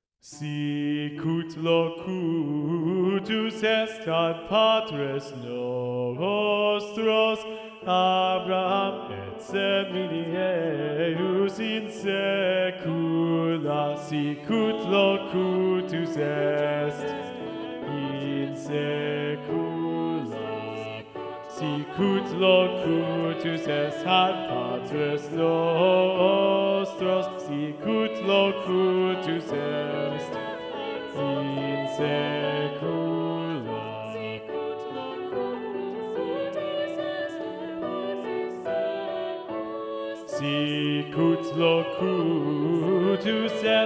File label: SATB - Bass Predominant; Sicut locutus est